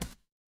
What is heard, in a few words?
retro game hit